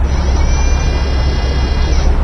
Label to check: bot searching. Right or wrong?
Right